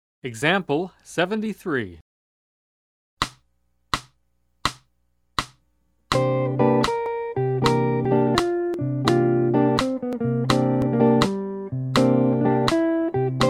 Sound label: Jazz; jue shi ji ta